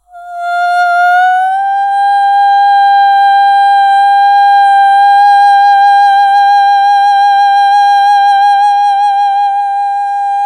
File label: Vocal Lines Cm 60Bpm; One-Shots; Ghosthack; SkyRealm